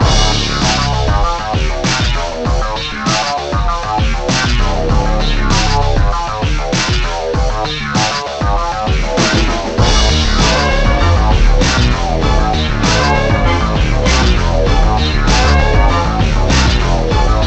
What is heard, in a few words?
BGM Rap; Future Cop L.A.P.D; Soukou Kidoutai L.A.P.D.; playstation